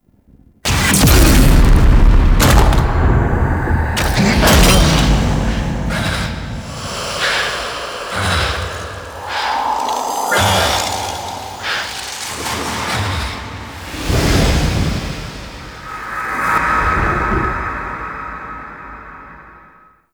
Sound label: Hit Falls; Cinematics; Erra; Warframe; TheNewWar; Throne Room